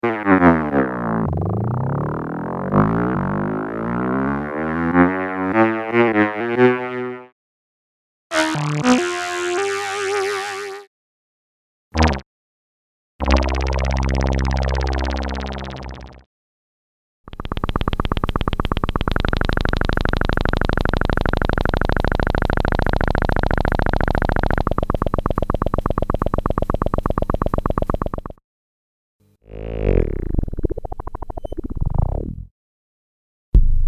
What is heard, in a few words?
Moog Modular